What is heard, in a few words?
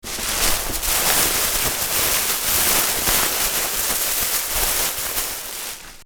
TLM 103 Plasticfoil Octopre pre ULN 2